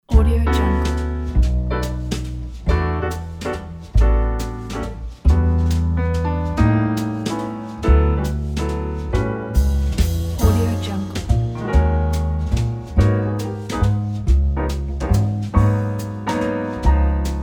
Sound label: jazz waltz; dollyzband